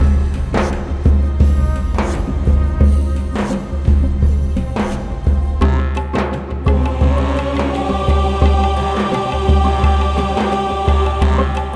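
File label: room music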